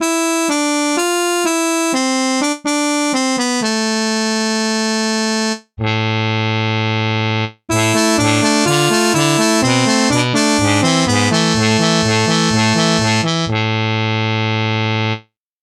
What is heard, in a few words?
muted trumpet